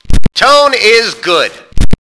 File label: TONE; APACHE